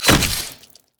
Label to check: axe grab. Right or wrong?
Right